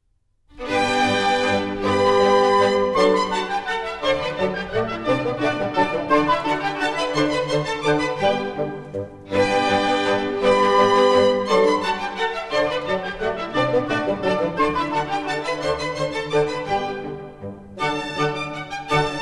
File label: German Dances, G Major; Mozart; Dances and Marches